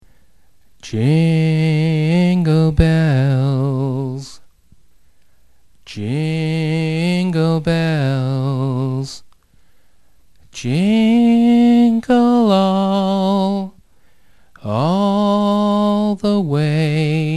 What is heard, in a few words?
jingle bells; xmas